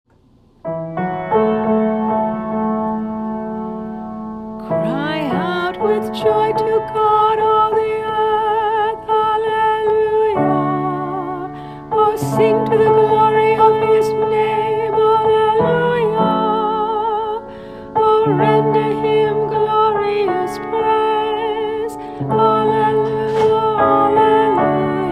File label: Antiphon; 3rd Sunday Easter